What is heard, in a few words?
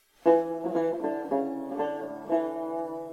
tanbur